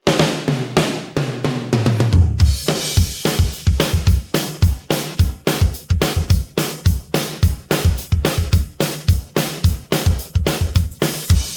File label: pattern, drums